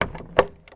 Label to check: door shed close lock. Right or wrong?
Right